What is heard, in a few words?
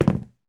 drop